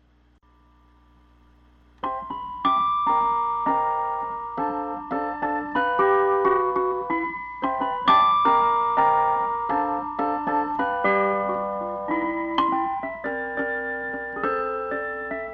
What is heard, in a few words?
Pre Piano